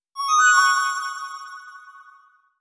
Text Mess; X1 Family 2

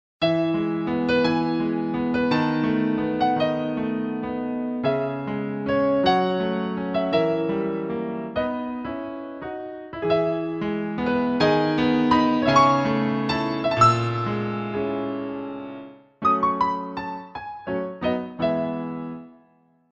E flat; Alcatel OT 916D

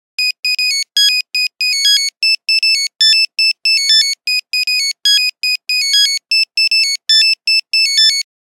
Nokia 1100 Samba, Nokia Buzzer; Windows Phone apps

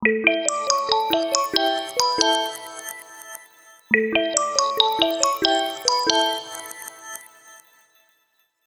Ring Fountain; Alcatel OT-3088X; ringtones

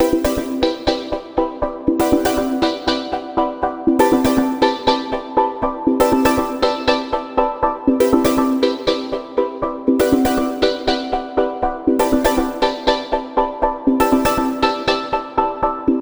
Alarm synth; Canonical; Ubuntu-Touch-16.04-OTA-5

